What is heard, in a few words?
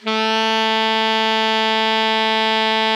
ALTO MF A 2, Sax; Greytsounds; Philip Wolfe David Crozier's Saxophones